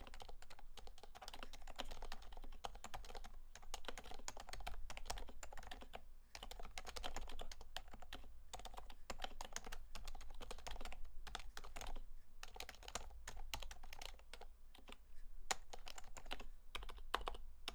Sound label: Leopold FC660C Silenced